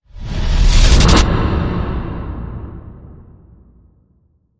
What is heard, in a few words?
Whoosh In Motion